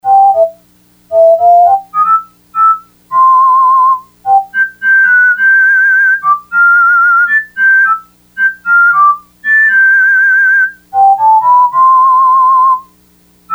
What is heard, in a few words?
Whistling, Yamaha DX100; Factory Patches